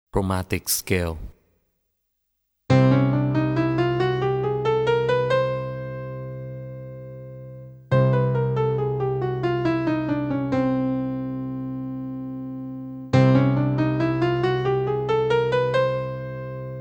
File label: chromatic